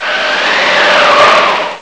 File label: wind long; lemmings; Amiga Soundtracker Sample Packs